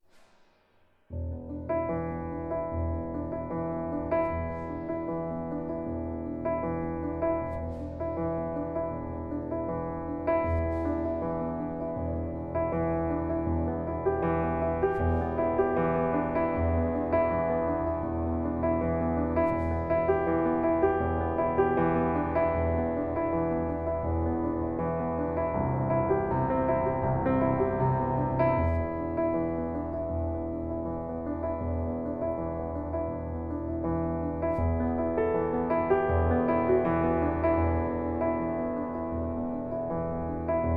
Broken Bells

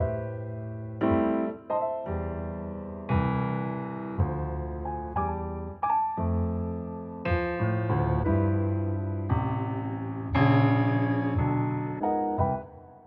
Piano Jazz

